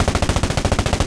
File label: loop shvak tnsh; guns; tanks; War Thunder